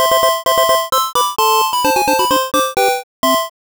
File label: jingle classic johann strauss radetzky